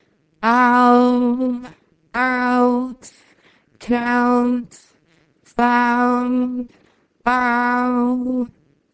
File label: singing